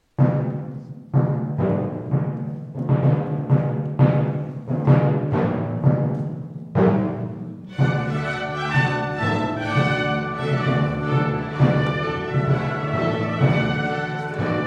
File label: Fanfare; Soupers du Roy; Delalande